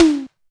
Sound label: Tom, Yamaha DD-20